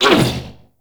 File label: Corpus Struggle; Warframe; Amalgam Override